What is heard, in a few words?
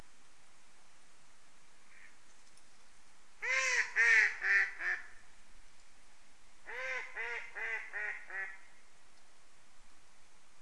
Mallard Anas platyrhynchos